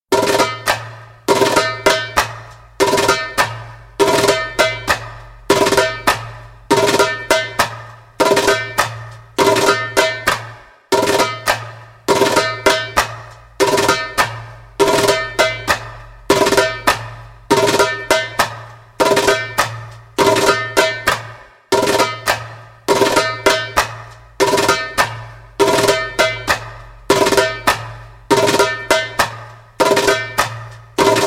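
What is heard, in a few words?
Drill in Nine (slow) spoken, djembe; Djembolale - Fills and Drills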